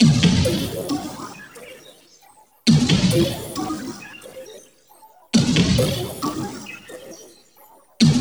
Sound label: fx loops; Zero G; Malice In Wonderland